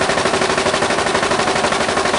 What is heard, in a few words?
FOLDING; 1000fx